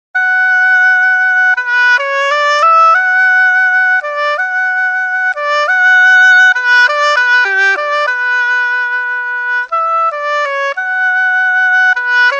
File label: oboe